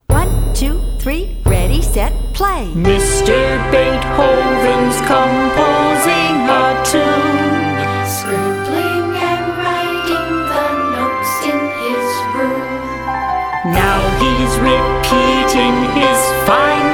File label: Accents